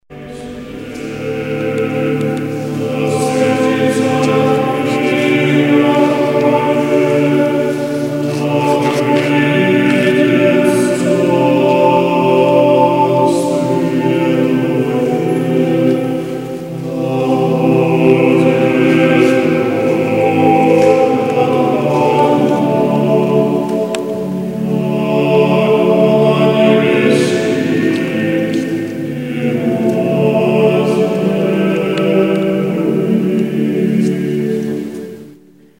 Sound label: choir